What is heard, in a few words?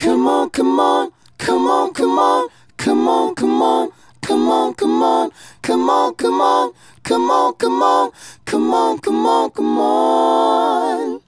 Male Group, Hip-Hop and RnB Vocals